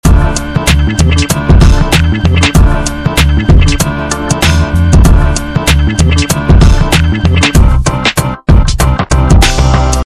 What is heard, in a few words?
96bpm